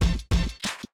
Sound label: 90s Hit; Samsung Galaxy S26 Ultra; Retro